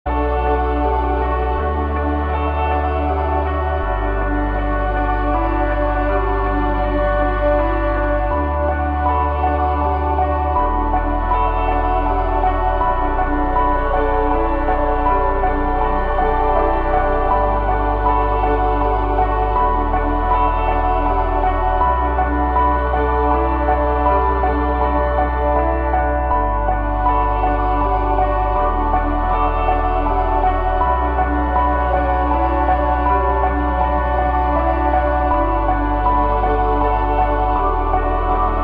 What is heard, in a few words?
ambient